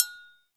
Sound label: triangle, percussion